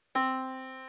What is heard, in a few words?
Yamaha Disk, piano sound